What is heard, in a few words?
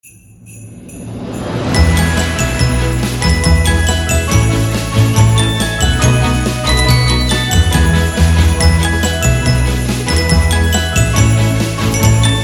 Jingle Bells